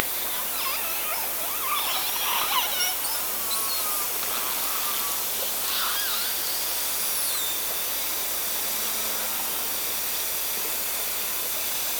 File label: Clicks Calls